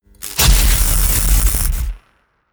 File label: electricity; comedy cartoon